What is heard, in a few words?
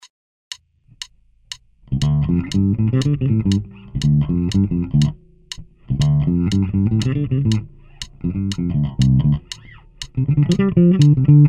riff